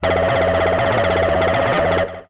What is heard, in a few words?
Synth Mono